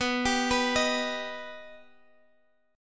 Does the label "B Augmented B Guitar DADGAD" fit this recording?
Yes